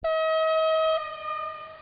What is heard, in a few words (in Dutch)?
fluitsignaal vertrek voor retour